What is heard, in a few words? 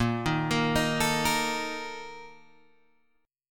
A sharp Major 7th Suspended 4th, Guitar Open G